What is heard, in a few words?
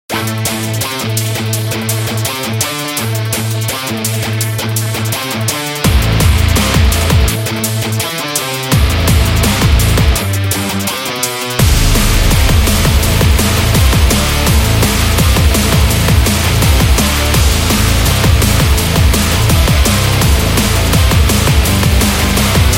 Full Speed, metal